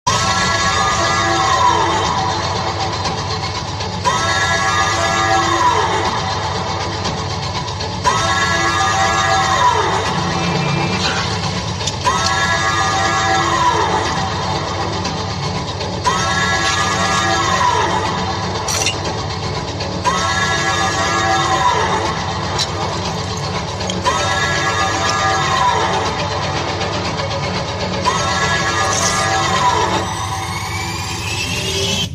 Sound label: car bgm; kgf 2